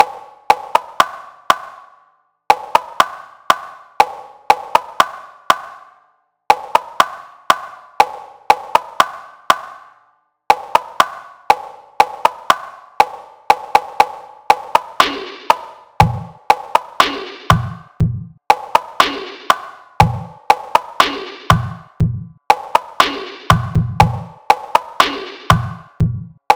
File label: Sinewave Project